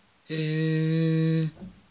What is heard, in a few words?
Vowel Sounds E